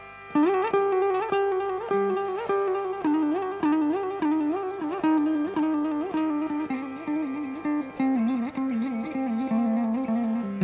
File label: Tanam phrases example, Hēmavati ālāpana; tanam-like phrases in Hēmavati ālāpana